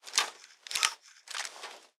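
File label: Weapon rifle plasma jam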